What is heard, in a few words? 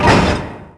close metal door